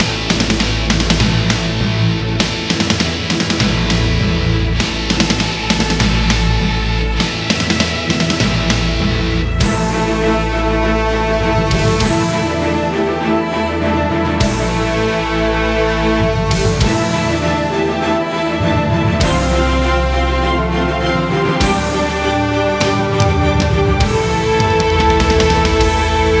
TENSION

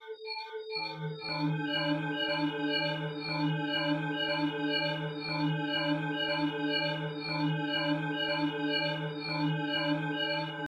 Temple Dojo B Ambient

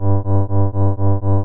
magnet loop; golf blitz 1.12

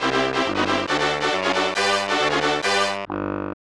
Jingle; Unknown Jingle 3

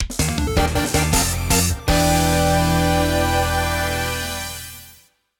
Jingle Finalresult; Goonya Monster

